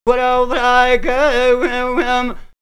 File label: Ethno Vox; Kirkuk; X-Static Goldmine; vox mix